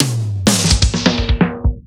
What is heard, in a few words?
Fills 128BPM, Clubsounds